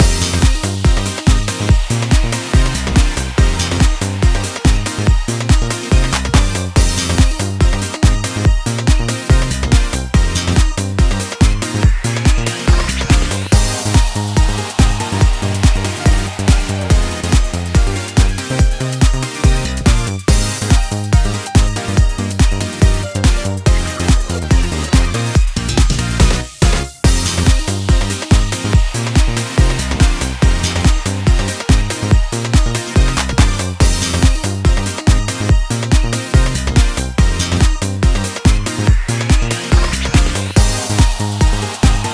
BGM RESULT

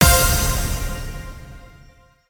SE SCORE PLATE; KALEID

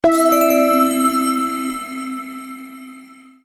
SE SELECT GATE; GATE 4; KALEID

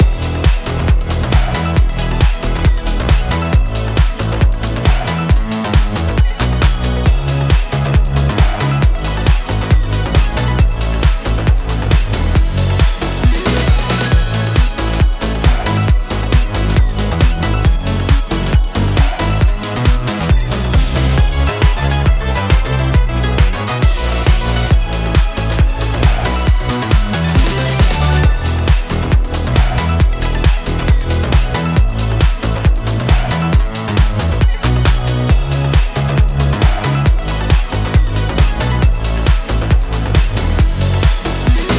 BGM RESULT

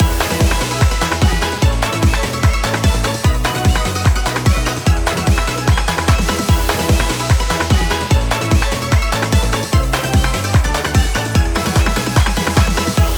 BGM RESULT CLEAR

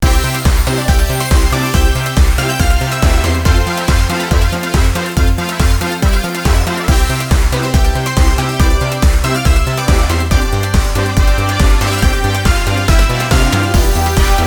BGM RESULT CLEAR

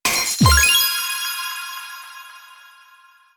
SE UNLOCK; KALEID

